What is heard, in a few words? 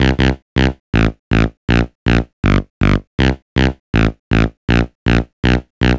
gro 160, Hardcore Loops, Bass Loops; Dance Synths